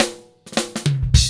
FILL, Funky Ass Loops

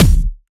Kick, Dance Drum Kit; 99Sounds x Monosounds; Twilight

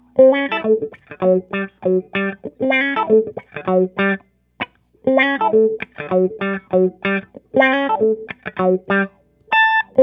PIMP WAH, Funk Gtr & Wah; PlatinumPhattCD2; E-MU Producer Series Vol. 8 – Platinum Phatt

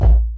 DP MOUTH K, HIP HOP; Pro-Rec - Synthsonic

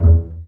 BA PIZZ E1, Orchestra; Best Service; Peter Siedlaczek's Advanced Orchestra

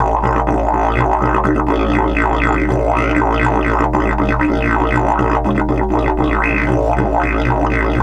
120, Didgeridoo

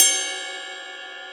Ride Bell, Drum Machines, E-MU Proteus-2000

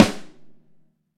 Ludwig Snare; Digital Sound Factory

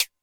A Squib, Drum Machines Alesis D4

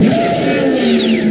SPEEDER; STARS FXS; East West; Global Sounds

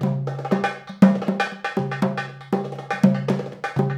PERLP, ArabianTraditions

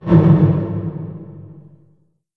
LH HT909, REVRB LARGE HALL; TR 909

